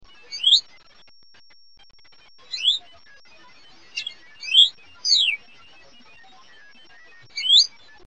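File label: Hutton's Vireo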